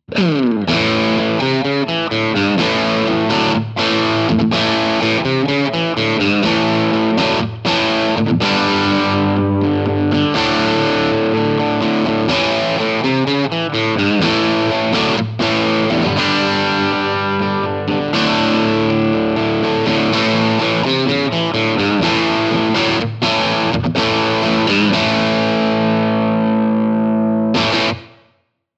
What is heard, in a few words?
characteristic sound; jensentone